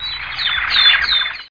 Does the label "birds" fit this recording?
Yes